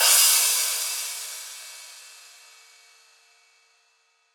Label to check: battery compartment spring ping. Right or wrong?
Wrong